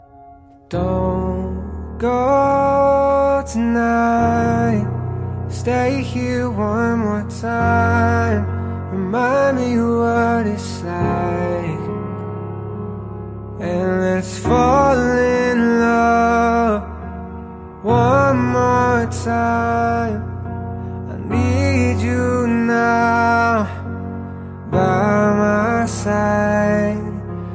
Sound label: Acoustic